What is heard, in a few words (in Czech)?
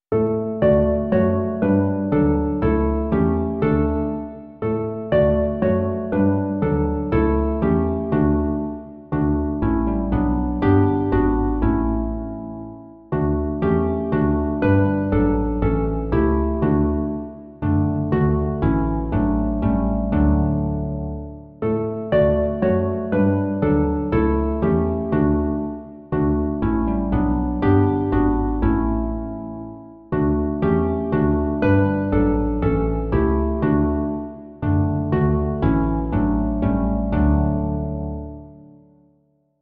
harfa a kontrabas; Ó Ježíši, ty v onu noc; Imaginativní verze